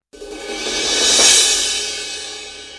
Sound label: sabian 18 aax dark crash